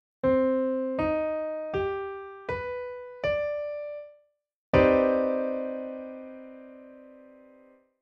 chord pf mmaj79

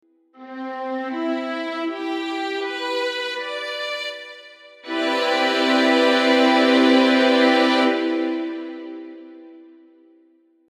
chord st maj79

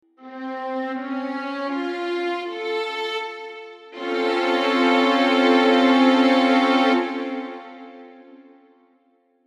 chord st susb9dori

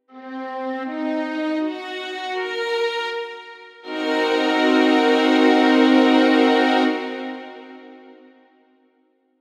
chord st m7b5

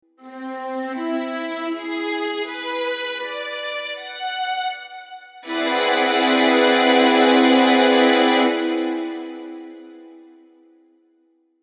chord st augmaj7+11

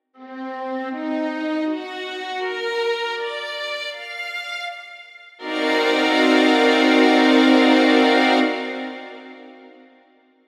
chord st m7b5

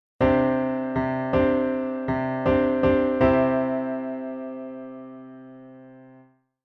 chord sus2 to 3

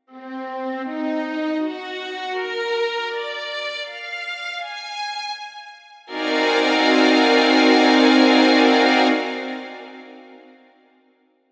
chord st m7b5b13

